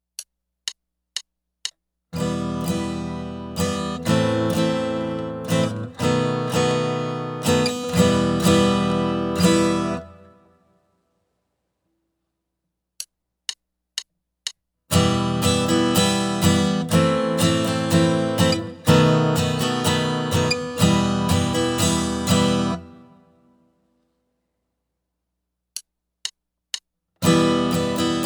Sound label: Chord Studies